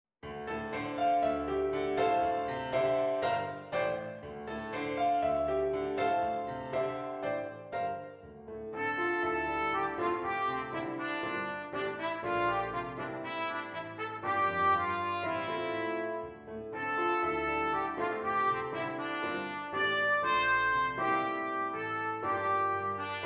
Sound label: Trumpet; flugel or cornet